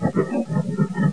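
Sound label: scuttle; Total Chaos Nukem 3d Pack; duke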